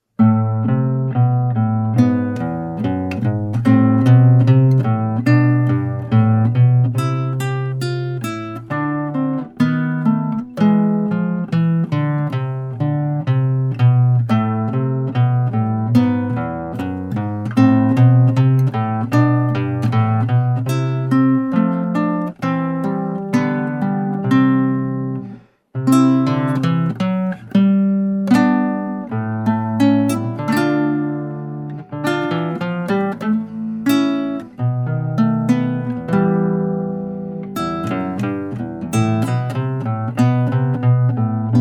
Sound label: Maestoso